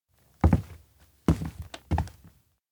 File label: steps indoor abandoned cafe walk close up; anemoi